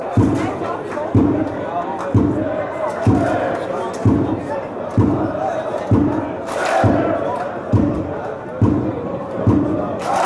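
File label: clap fc thun, football songs and soccer chants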